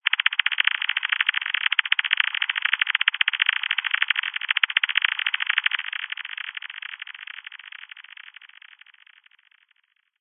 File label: science fiction radio receiver transmission interference burst code blips messy; zapsplat